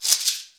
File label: CHEKERE; East West; Global Sounds